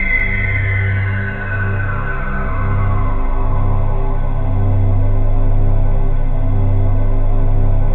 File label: ATMOPAD; Best Service; XX Large; Pads